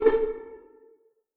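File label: string pizzicato A3 pianissimo left; Sound On Sound - Peter Siedleczek's Orchestra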